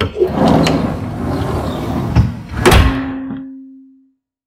Uh-1H pass door close; Aircrafts; DCS

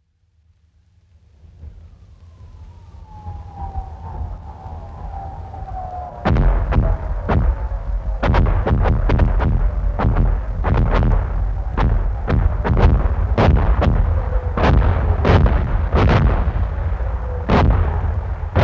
Amb Destruction; Red Orchestra 41-45

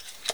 Inf Weapons Foley 3rd; Red Orchestra 41-45

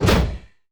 N37 Arming On End; Weapons; DCS

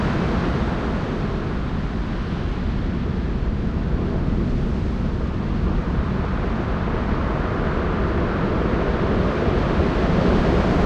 ambient port default; World Of Warships

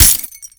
bullet glass; weapons; Modern Warfare

